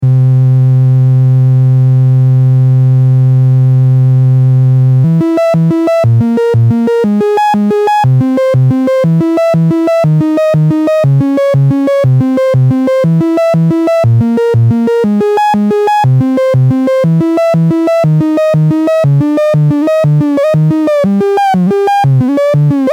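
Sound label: paper synth triangle wave expfm linfm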